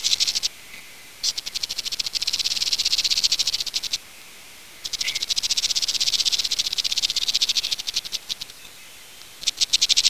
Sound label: snake